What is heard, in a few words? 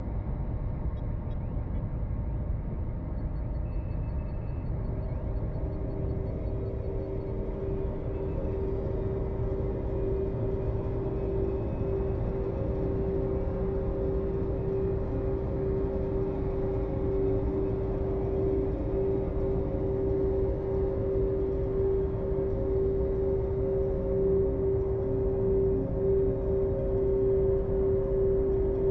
Ambience; Fallout Brotherhood of Steel; PlayStation 2 Xbox